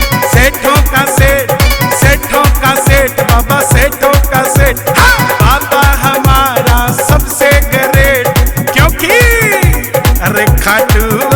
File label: Bhangra